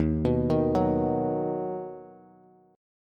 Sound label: Eb Minor Sharp 5th Bass; Ebm#5 arpeggiated; JGuitar